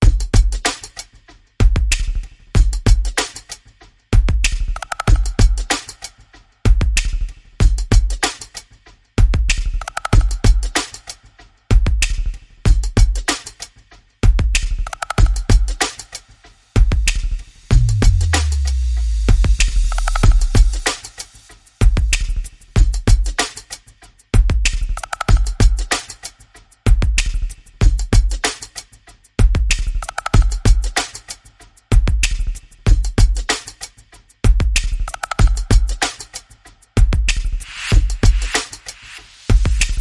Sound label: Beat